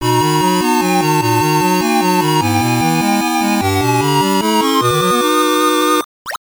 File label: Round Clear; Noboranka; System 1; arcade